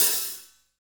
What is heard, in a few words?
hi-hat; Hi-Hat menus